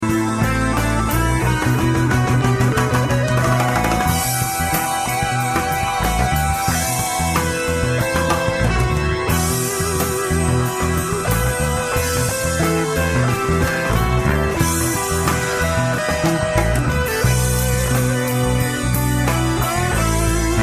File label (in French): solo guitare